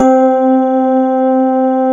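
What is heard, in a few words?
grand piano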